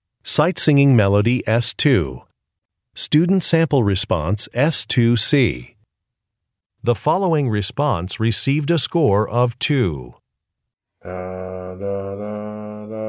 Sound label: music samples; Sight-Singing